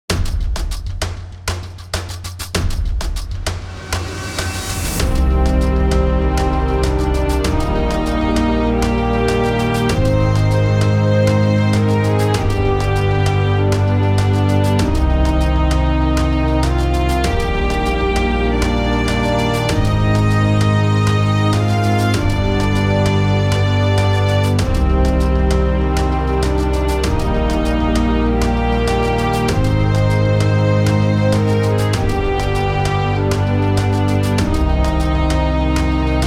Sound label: Epic Triumph